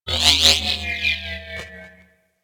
Robot Metallic Textures, Ambience; Venus; Objective Rooms

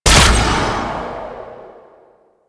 Archer Rain Of Arrows Fire A; Warframe; Duviri; Duviri Dax; Abilities

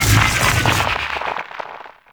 Purify Mollusk Extract End; Warframe; Infested Eris; Encounters

